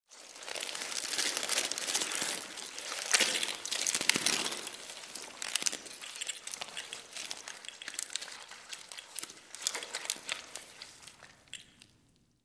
Wet Beetles Eerie Sl, insects

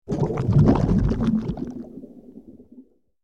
Heavy Underwater Exh, bubbles

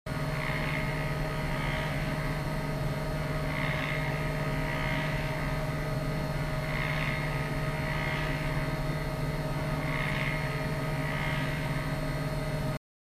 Spectrometer; Machine Running, Whine And Hum

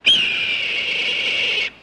Hawk Call Single

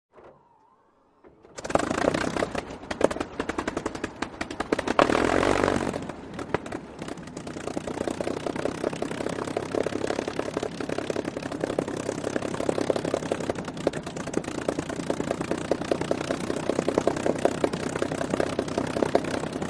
P51 Mustang Prop Plane, WW2 Fighters

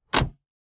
SUV gate close, tailgates

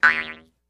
Jews Harp Shrt Vibr, boings

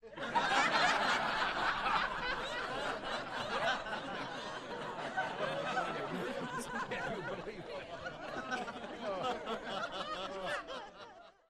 Crowd Hearty Int, small int

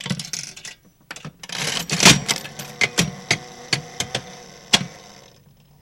Slot Machine Coin Into Slot Lever Pull And Run

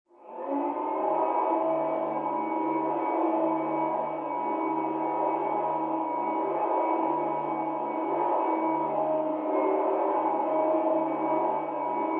Vacuum Various Move; fans

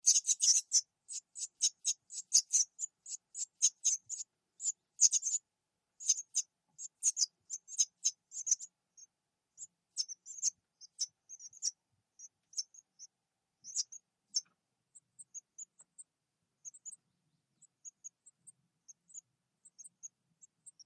Baby Wrens Nest Peepi; Baby wrens in nest, peeping quietly ( New York State )

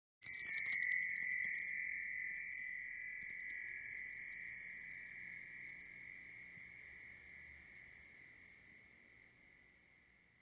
Light Metal Wind Chim

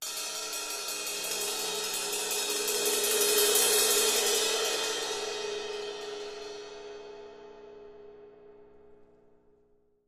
Cymbal Two Crescendo

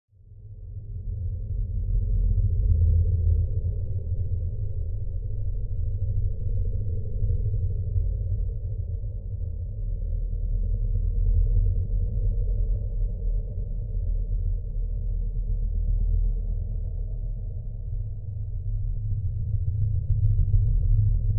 Ambience Alien Hive, drones

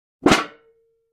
Aluminum Pan Buckle; barrels buckets & cans

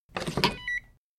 Swipe Key Card Alarm; hi-tech-various

